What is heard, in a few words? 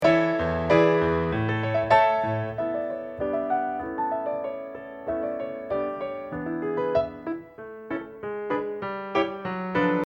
accomp